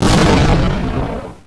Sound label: explosion